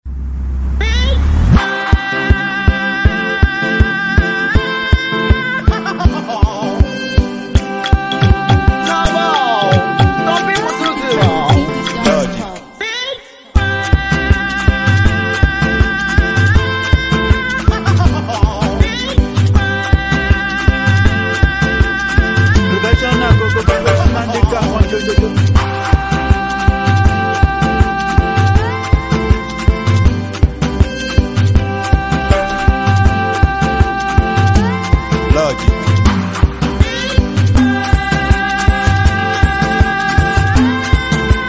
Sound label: Professional Beat